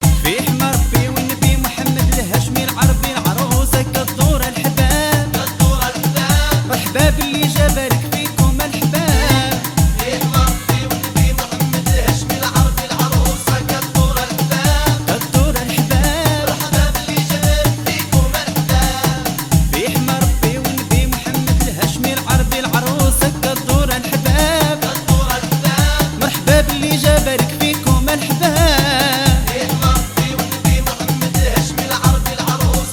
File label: Orchestre, مغربية